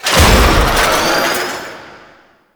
Galleon Turret Enabled; Warframe; Ambience; Grineer Galleon; Vor Galleon Fight

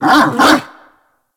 Kubrow Tame Level Up Bark A; Warframe

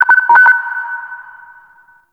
Interior Orbital Station Telemetry Mid; Warframe; Tau

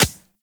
Beach Ball Bounce Dirt; Beach Ball Floof; Water Fight

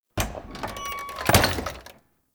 Tailor Shop Door Close; Warframe; Ambience; Nineteen Ninety Nine

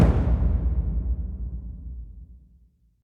Rand Drum; Hubs; Syndicate Arbiters

